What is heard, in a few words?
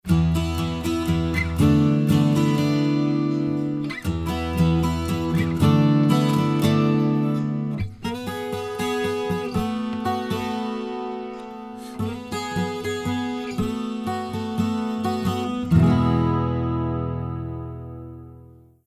Lewitt LCT 1040 Song Guitar MixSat Niere Dry